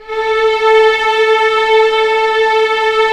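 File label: cellos, forte, orchestra; String Master Series; Vlns 7 Orch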